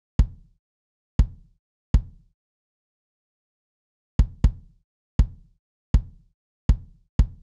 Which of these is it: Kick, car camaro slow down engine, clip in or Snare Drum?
Kick